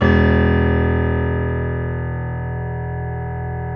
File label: PNO, KEYS; Synclavier Sampler Library